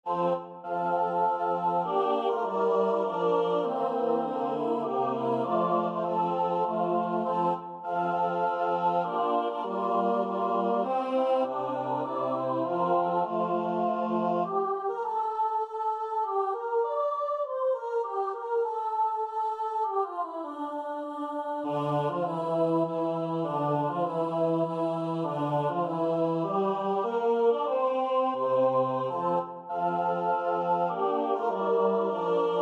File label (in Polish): midi mazur; maszynski